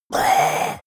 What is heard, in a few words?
leprechaun gasp; koerpergeraeusche; comedy-cartoon; soundpool